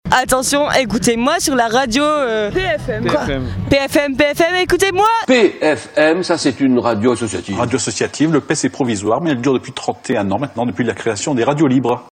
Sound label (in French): bourrée